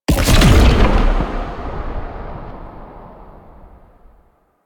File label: Hazard Fracture Disable; Warframe; Duviri; Undercroft Hazards